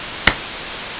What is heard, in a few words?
clap noise; claps